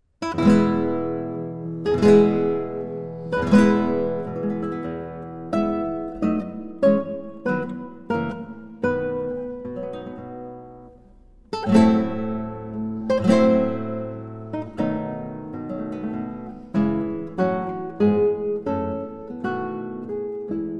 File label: guitar duo; Fantasia Opus 54